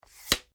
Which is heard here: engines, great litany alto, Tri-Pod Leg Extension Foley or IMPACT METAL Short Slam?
Tri-Pod Leg Extension Foley